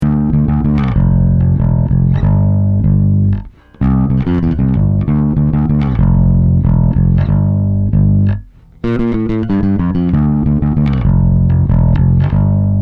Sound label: Bass; Two Notes Le Bass